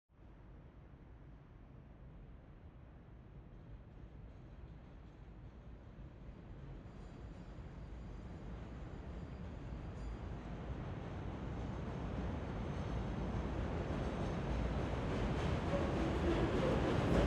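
L train by moderate speed under tracks perspective, Chicago Rapid Transit; The L; Griffin Sound Design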